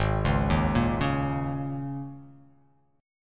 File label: G sharp Suspended 2nd Suspended 4th, G sharp, down